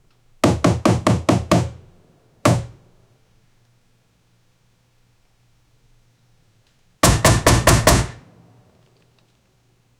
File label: powerful slam dunk, basketball